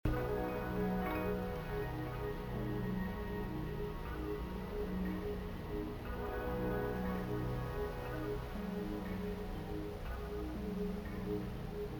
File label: ambient track